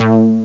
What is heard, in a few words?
fun bass